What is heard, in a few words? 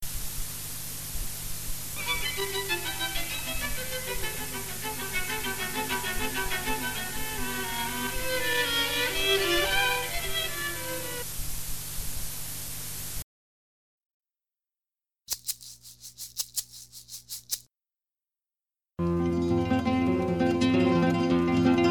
serie instruments